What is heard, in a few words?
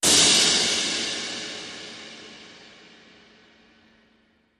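Cymbal Carillon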